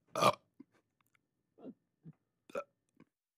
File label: Shrt Belch